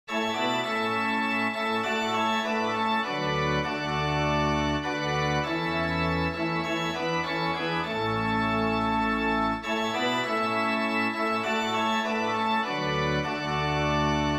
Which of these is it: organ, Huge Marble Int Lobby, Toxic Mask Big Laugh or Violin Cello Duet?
organ